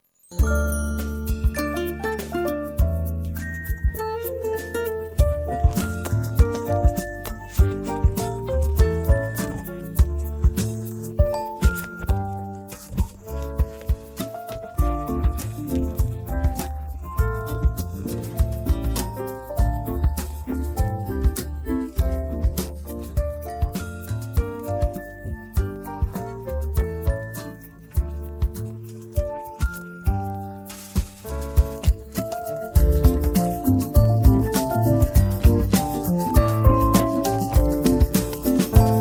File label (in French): Musique Noël; RATP